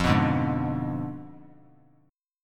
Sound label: F Diminished 7th F down; Fdim7 strummed; JGuitar